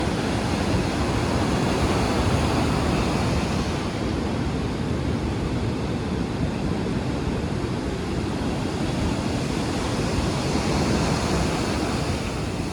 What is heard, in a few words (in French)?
son mer